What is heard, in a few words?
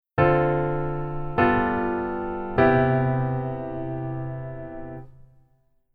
keyboard voicing form